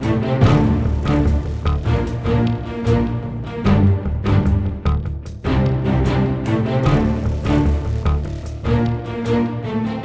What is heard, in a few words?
Boss Battle Loop Stem